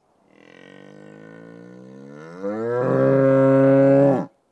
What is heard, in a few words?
Bison